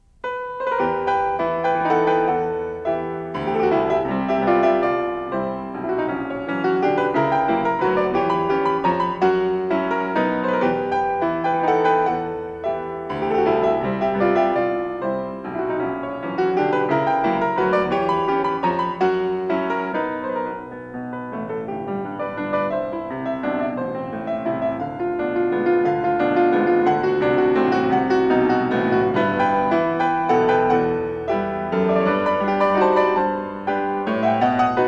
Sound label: Waltzes for piano, 4 hands (or piano), No. 4 in E Minor. Poco sosten; Waltzes (16); Brahms